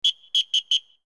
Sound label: referee whistle